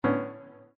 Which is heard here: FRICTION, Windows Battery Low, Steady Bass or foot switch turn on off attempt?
Windows Battery Low